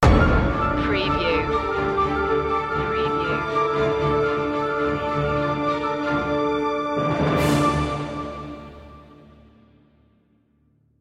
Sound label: sailing in distant lands loop end; media music now